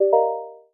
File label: hint